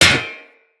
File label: Melee Hammer Impact Generic; Weapons; Impacts; Hammers